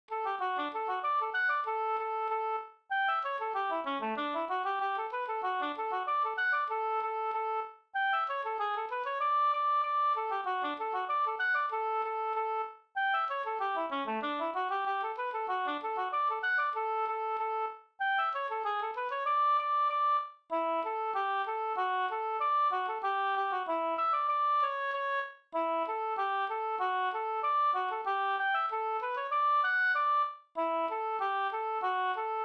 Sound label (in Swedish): folkdans kadrilj